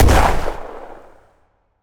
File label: explosion small; Work on vfx and sfx for traps.